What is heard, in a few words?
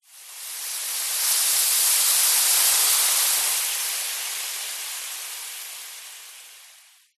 horror acid burn; zapsplat